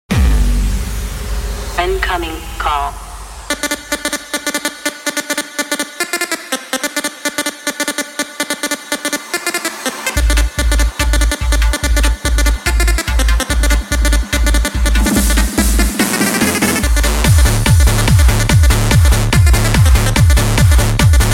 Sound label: Incomin Electro Call